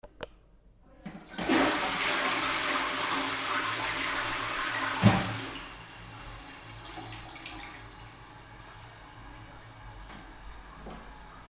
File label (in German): Klo